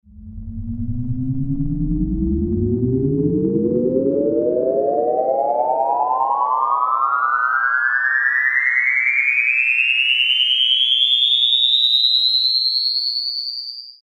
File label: Flying saucer up